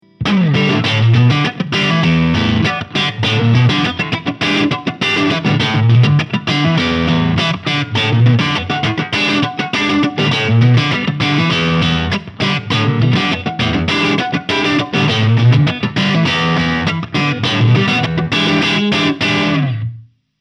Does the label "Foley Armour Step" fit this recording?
No